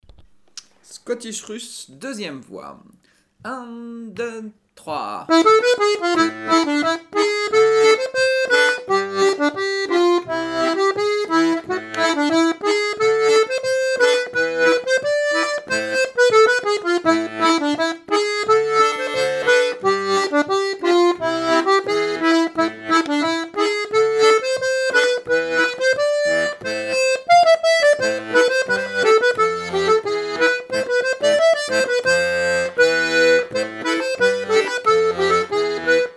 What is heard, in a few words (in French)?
scottish russe voix2; ateliers diato 2; L'atelier de la casserole